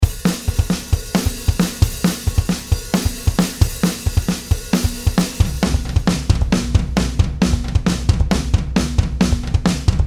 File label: Black Kit